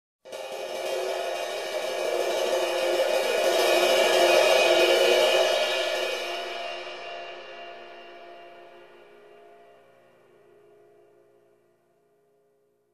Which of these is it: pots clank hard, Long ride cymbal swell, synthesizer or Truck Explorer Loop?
Long ride cymbal swell